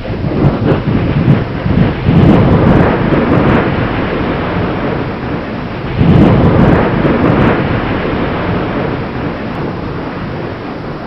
erupt; svencoop; legends

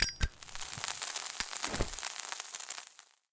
flare burn; svencoop; arctic incident; ambience

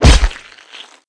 hit bod, weapons; svencoop; legends

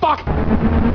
train use; svencoop; cracklife

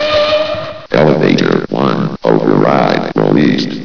lift; svencoop; echoes; voxlines

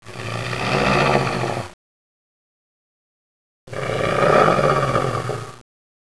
snore; svencoop; lostmaze

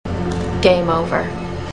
game over; saw

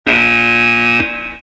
buzzer; svencontra2

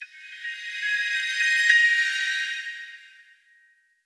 win; svencoop; hc2

